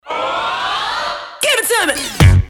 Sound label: Sax; Name That Tune In One